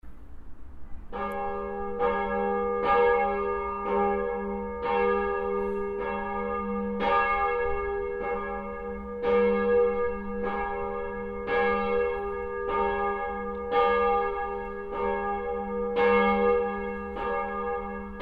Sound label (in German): Glocke; Marien-Glocke; St. Lorenz